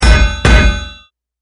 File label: item repair